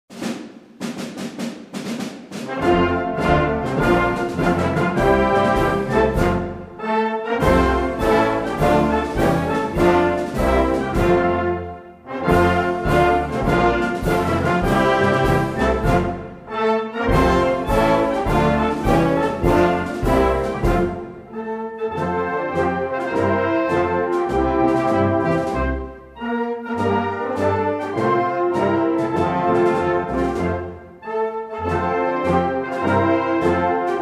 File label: anthem egypt